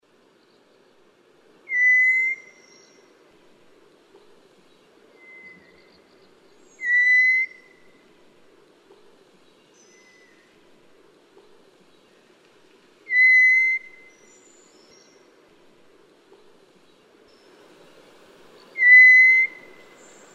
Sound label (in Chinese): 虎鶇; 虎斑地鶇; 鶇科/冬候鳥、留鳥